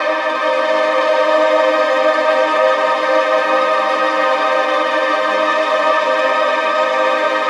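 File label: Atmospheric Loop C minor 128, Melodic Techno; Rational; Ghosthack; AC2024 - Day 12 - Melodic Techno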